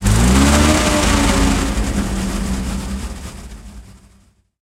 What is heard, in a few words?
m4a3 engine load, engines tanks; War Thunder